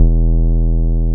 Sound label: BAS MKS-50, Roland